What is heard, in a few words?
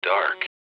pitch rise